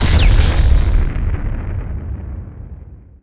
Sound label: Blast; ImpGal2; groundwar